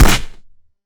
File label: Bullet hit stone; Hovl Studio; SFX Battle sounds; WhiteMan Unity2D